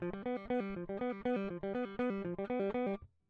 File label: electric